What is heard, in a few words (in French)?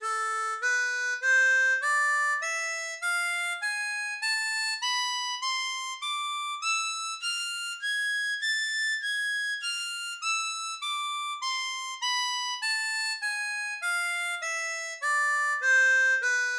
La Mineur, Gammes, harmonica